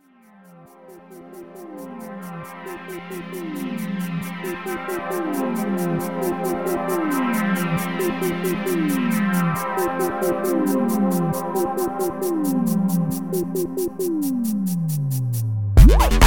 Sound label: Dubstep Future Bass